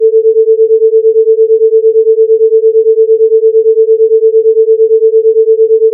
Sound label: beats